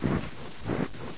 he idle, houndeye; Source Engine; bshift

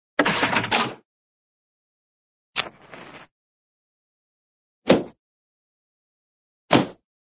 lever, cartoon slot machine lever pull

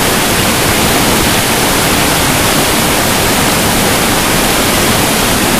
falls; source engine; left4dead2

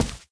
run tile, footsteps

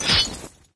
knife hit wall; weapons; source engine; dod

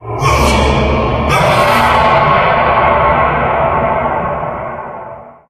male scream; Source Engine; tf

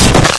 hornet hit; source engine; hl1; video games

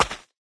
step dance; taunt; player; yeti standee; engineer; source engine; tf

